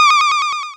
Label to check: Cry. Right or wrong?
Right